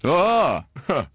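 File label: male happy; People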